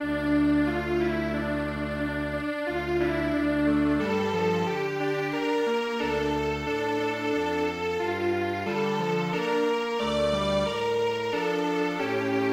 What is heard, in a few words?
Strings Quartet; Drawing Apple